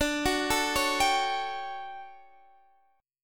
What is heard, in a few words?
D Major 11th Guitar Standard, chord; arpeggiated; JGuitar